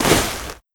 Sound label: Cloth; Scum